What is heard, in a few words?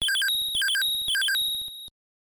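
sounds for notifications on phone in 8-bit style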